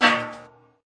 big cans, Big Rattle Cans

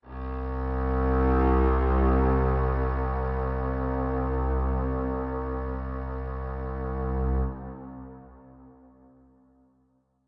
Cello Verb C1, Verby Solo Cello

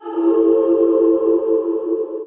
Layered Vox, Female Layered Synth Vox

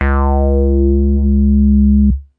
tight square C sharp 4, Tight Square Bass

